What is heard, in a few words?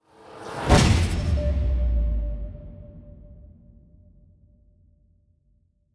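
Music Orchestral Transition Race end sweetener; Forza Motorsport 6 Apex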